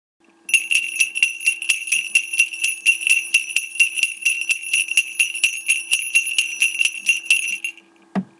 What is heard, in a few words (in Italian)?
suono sonagli